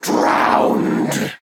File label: Principal Water; AudioWizards